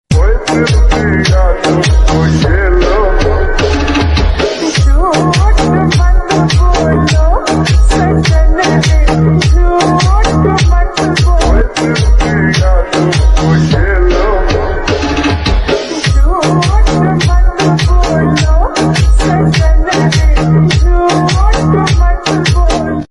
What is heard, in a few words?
Remix Hindi